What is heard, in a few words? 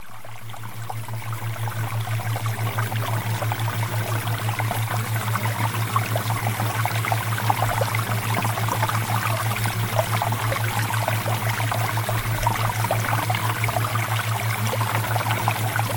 Water Theta Waves; PERFECT VISION